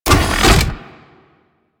Arachnoid Camper Missile Open; Warframe; Corpus

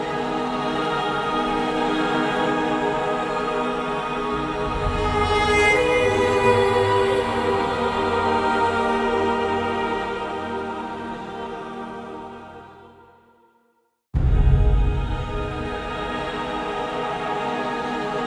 Descend Night Fold Cue, Music; Tuvalkane

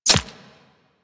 Player Arrow Impact Flesh; Warframe; Weapons

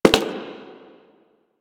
Human Loid Foley Step; Warframe

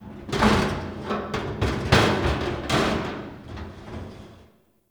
Destruction Metal Scrapes; Ambience; Warframe; Corpus Railjack

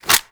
Pistol Reload End; Weapons; Warframe; Tenno; Priest Pistol

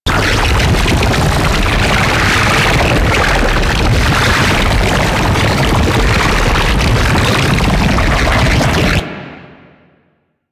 Slinky Dead Zone Pool Loop; Warframe; Man In The Wall

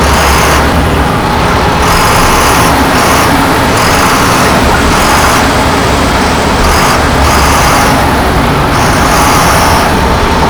APC Move Loop; Enemies; Lasrian; Warframe

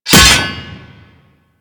Axe Impact Default; Warframe; Weapons

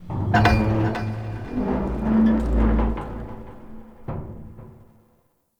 Castle Misc Metal; Ambience; Midrath Island

